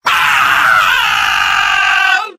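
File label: pain critical death; soldier; video games; source engine; tf; mvm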